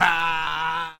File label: ah